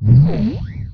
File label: Sci Fi Gun, Blast; Space Blasters2D